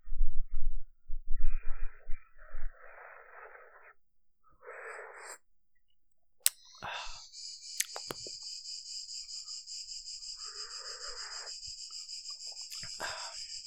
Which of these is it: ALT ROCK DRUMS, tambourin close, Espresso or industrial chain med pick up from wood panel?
Espresso